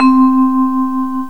Vibraphone; GMPlay; Chromatic Percussion

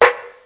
STOP; Comedians - The People Behind the Laughter

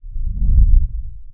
enter menu; PC Gamer IT CD 41; Daikatana

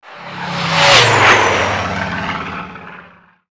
FLYBY; pcdemo

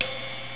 RIDE

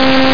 static buzz

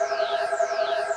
ufo; disasters

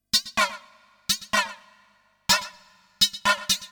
HOUSE 125

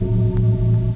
Magic; Majesty; OnlineGame

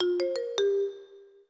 ft incoming; PSI

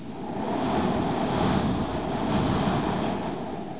WIND

